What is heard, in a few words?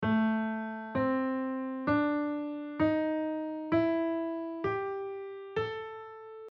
A minor penta blue note